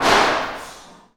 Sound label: Stairwell L